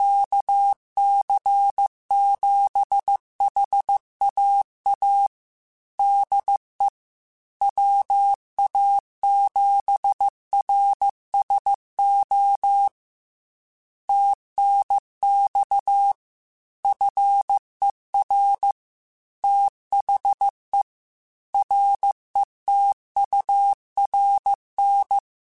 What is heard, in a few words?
Morse Code, QSOs